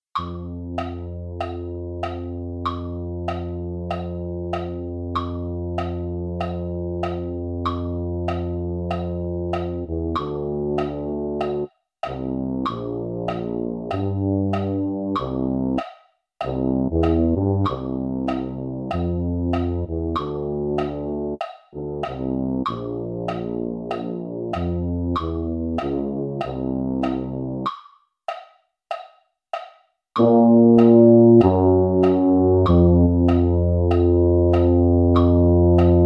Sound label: tuba, MIDI